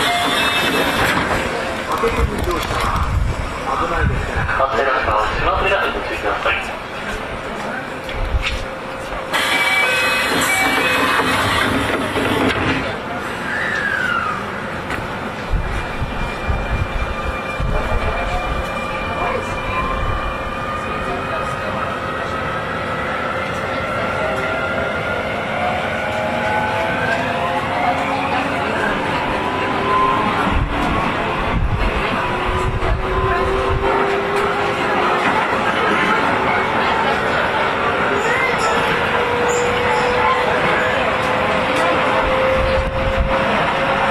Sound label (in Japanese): 9000系 笹塚-明大前, 走行音, VVVFインバーター制御; Keio; Keio Line; 京王線の最新型車両です。; グッドデザイン賞受賞